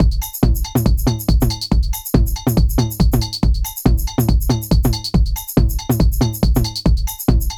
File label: TECHNO; INIS